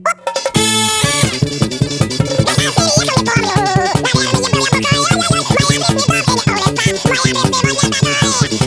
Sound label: pitch